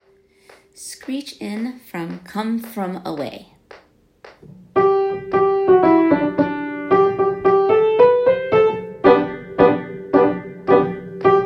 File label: Screech unison; Broadway Shabbat; Screech In